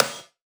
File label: Snare, Dubstep One-Shot Drums